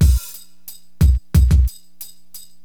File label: Drum loop, HipHop; LiveAct Pool 1